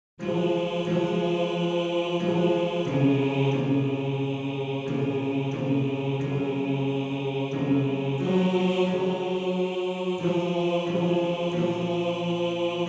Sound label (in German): Bass; Morgenrot; Bass 1